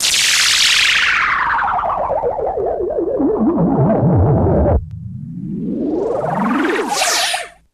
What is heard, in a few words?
slow down; Zaps and Beeps